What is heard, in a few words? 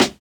Hiphop snare